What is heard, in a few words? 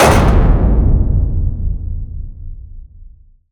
impact; Bluezone Corporation; Cinematic Impact Sound Effects; Steampunk